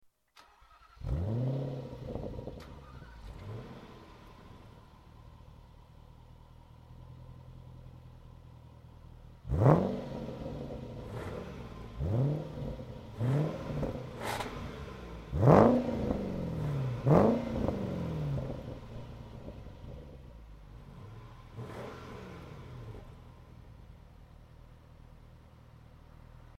Jaguar XFR spires and standard exhausts

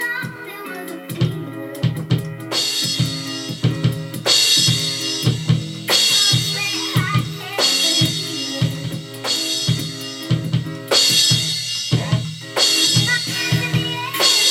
drums

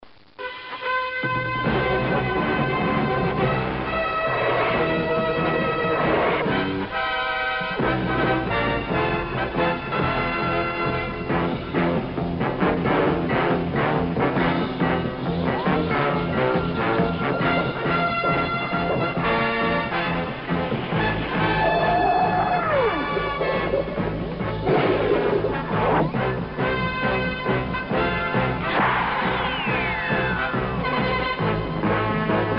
intro instrumental; superamigos

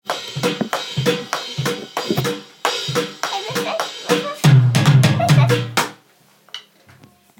drums

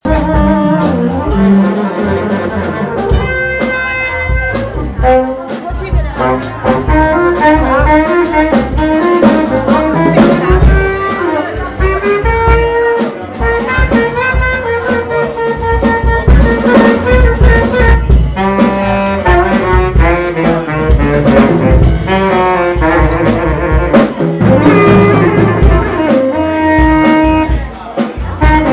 pub jazz

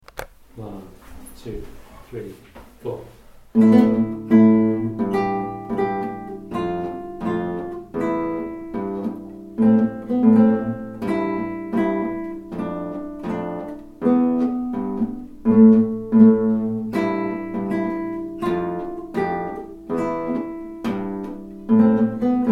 bass

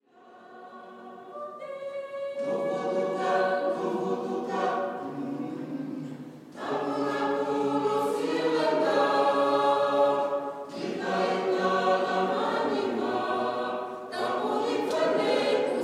Windhoek Catholic church choir

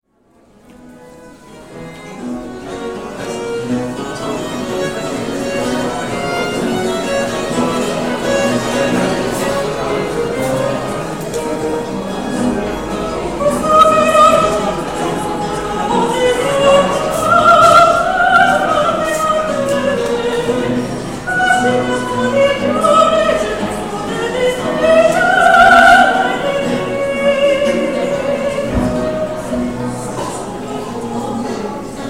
baroque musicians loud soprano singing; distortion; national gallery

